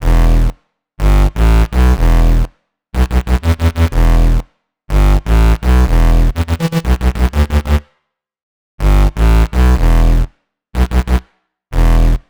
123 Em Bass, Complex Electro Synths; Prime Loops; Onyx

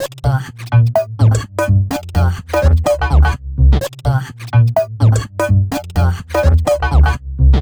126 C COMBI GROOVE, Funky Underground; Loopmasters; Funky Underground Vol 2; COMBI GROOVES

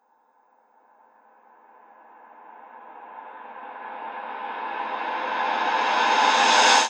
FX Uplifter

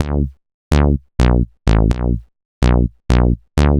126 D B BASS, Funky; Loopmasters; Funky Underground Vol 2; BASS LOOPS

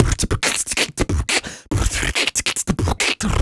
Human Beat Box 140 Pop; Loopmasters

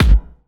Kick

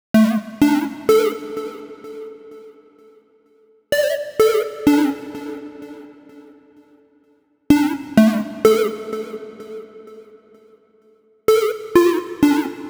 128 Dm Plucks, Complex Electro Synths; Prime Loops; Deus

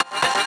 C STAB; Loopmasters; Funky Underground Vol 2

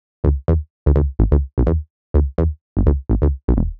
126 D E BASS, Funky; Loopmasters; Funky Underground Vol 2; BASS LOOPS

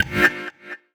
F STAB, funky; Loopmasters; Funky Underground Vol 2

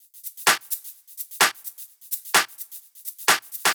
Loop, 128 BPM

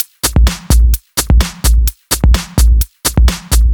Dirty Dutch Loop; Mutekki Media; Vengeance Electroshock Vol.2

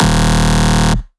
Synth Shot D#; Mutekki Media; Vengeance Electroshock Vol.2

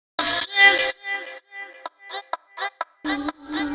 126 G VOCAL LOOP, Funky Underground; Loopmasters; Funky Underground Vol 2

